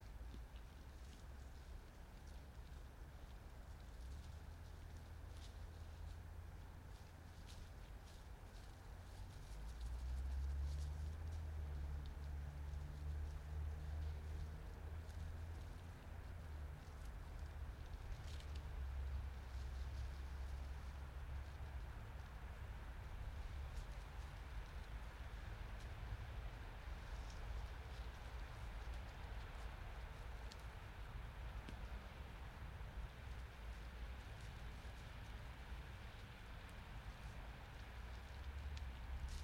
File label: yamanakako sound